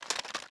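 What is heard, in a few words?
Inspect Movement; valorant; prime vandal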